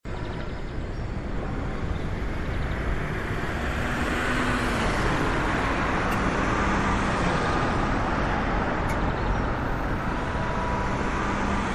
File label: drone